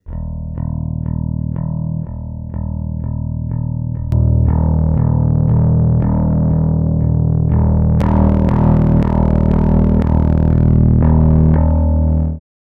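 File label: clean overdrive fuzz, Pocket Bass Amp; palmer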